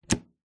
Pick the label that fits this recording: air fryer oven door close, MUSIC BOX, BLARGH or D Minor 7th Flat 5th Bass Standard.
air fryer oven door close